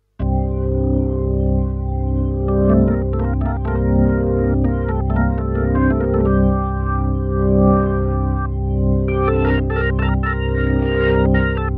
Organ Drive Drive

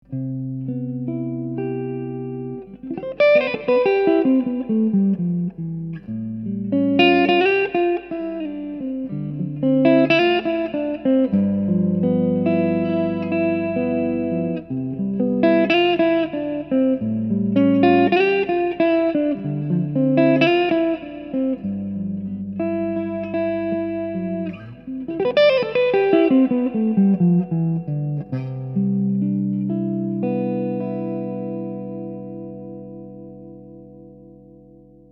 Clean Les Paul Neck

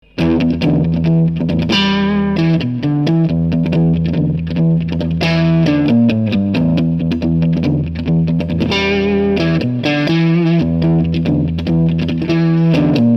Crunch Duesenberg P90 Neck

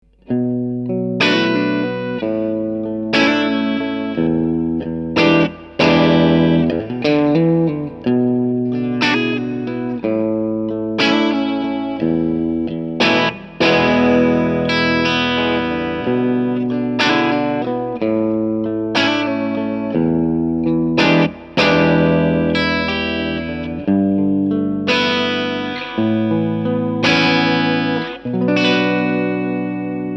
Crunch light Tele Bridge

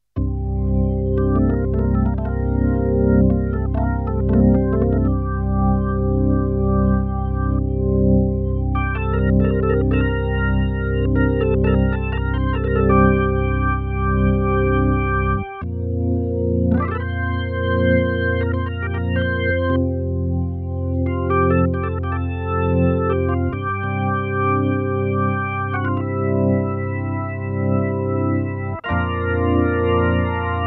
Organ Drive Clean Bypass

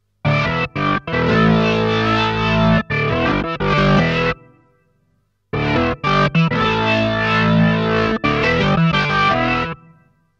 Organ Drive

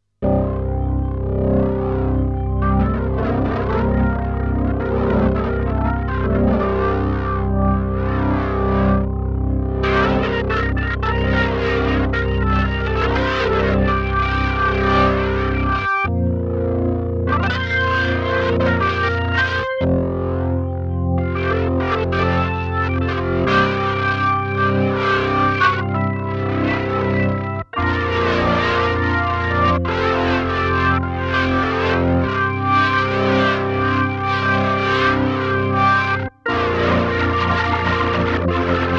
Organ Drive Extreme On

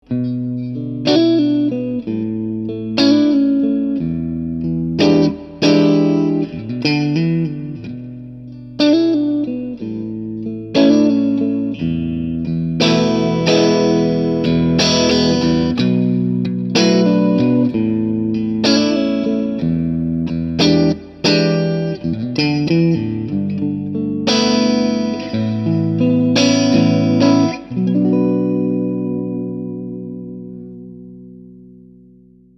Crunch light Strat SC Neck Middle

